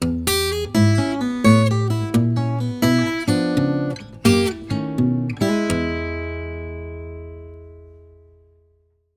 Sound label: Walking Bass Turnaround